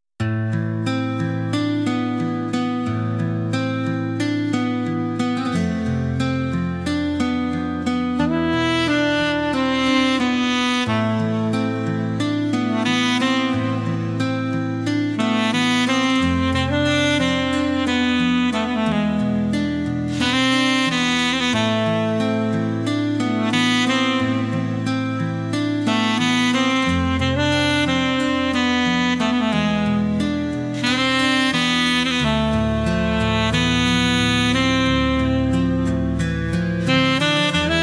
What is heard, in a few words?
smooth sax journey